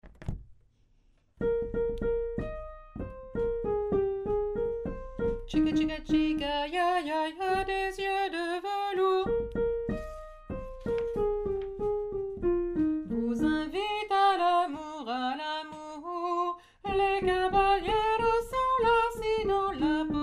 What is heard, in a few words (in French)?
alto